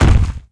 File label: walk, footsteps; earthshaker